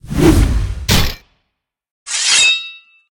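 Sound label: real undead warrior spawn, monster; thedead3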